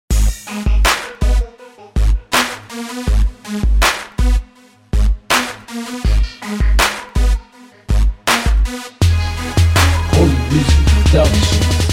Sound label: crunk beat